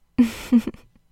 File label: giggle